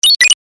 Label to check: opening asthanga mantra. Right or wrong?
Wrong